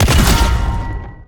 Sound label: shotgunx